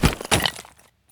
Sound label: bodydrop tile; Escape from Tarkov